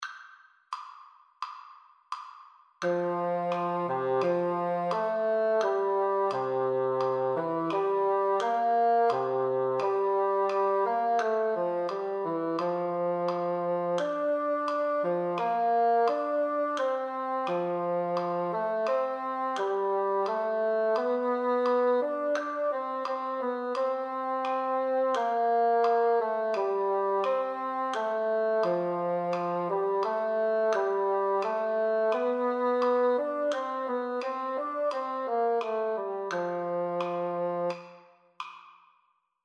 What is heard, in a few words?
bassoon